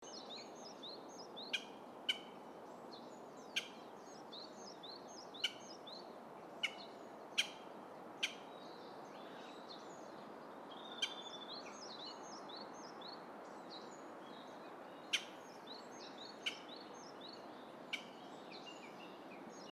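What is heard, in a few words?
aogera, green pigeon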